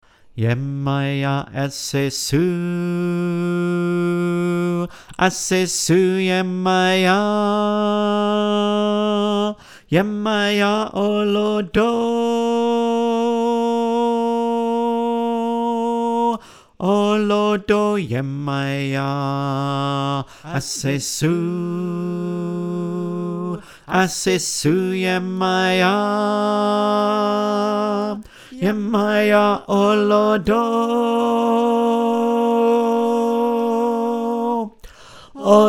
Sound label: Bass line, choir